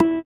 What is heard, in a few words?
SITAR; Spectrasonics; Metamorphosis; INDIATEK